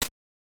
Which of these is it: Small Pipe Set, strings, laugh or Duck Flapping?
Duck Flapping